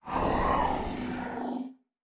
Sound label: Cry